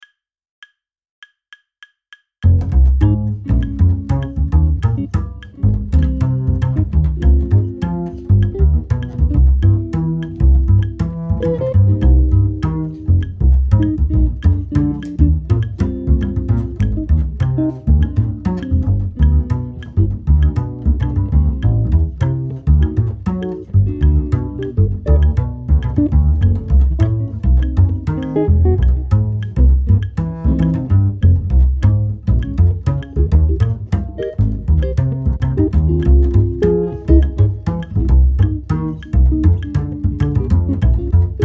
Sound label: bas metronom; Decim metronom; In this exercise I use the root, the fifth and the tenth of the chords to create a bass-line.; Torben Bjørnskov